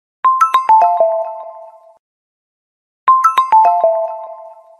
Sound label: Text Alert Sound for Mobile